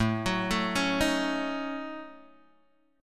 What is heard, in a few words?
A 6th Flat 5th, Guitar, DADGAD; 7,9,7,6,6,x